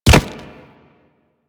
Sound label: Infested Charger Step Default, Footsteps; Warframe